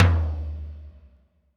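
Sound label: Tom Lo Clear Wet, Alesis D4 drum machine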